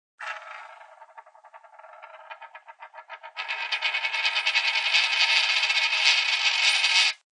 Spinning a coin on flat surface; Clinks

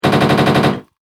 Rapid Machine Gun Shots

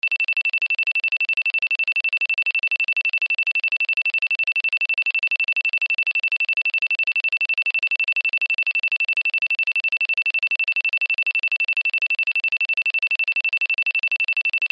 Sci Fi Alarm Digital Beeps Loop; Control Panel; Blastwave

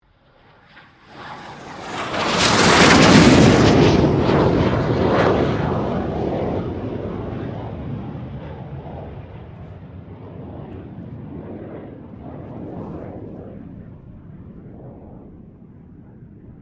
FA-18 Hornet pass; RAAF FA-18 Hornet; Airplanes

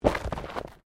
Body movement Impact Roll Gravel

